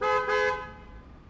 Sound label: Double Car Horn